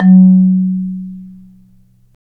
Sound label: Marimba; Roland LCDP11; Africa VOL-1; Af.Marimbas